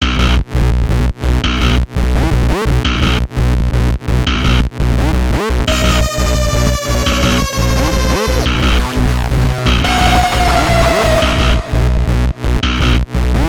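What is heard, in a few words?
Ambient Loop; Transformers: Revenge of the Fallen; PS3; Windows; Xbox 360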